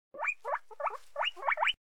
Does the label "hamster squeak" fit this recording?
Yes